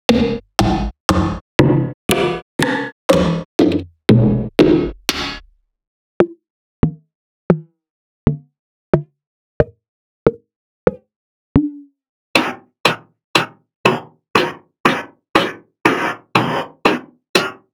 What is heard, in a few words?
board Perc